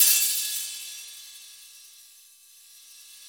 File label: CYM 15, Brush Kit; Club-50 - Foundations Roland; Brush Kits